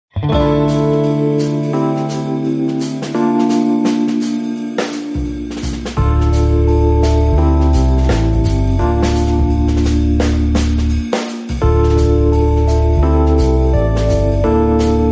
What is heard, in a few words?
Lazy Guitar, Rhythm